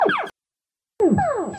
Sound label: shoot